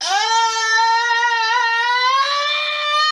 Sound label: it is wednesday my dudes scream